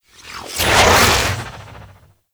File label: Charge; Warframe